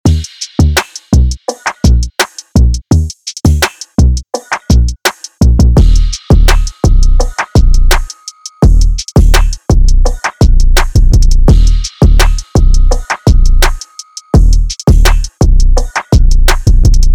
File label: drum loop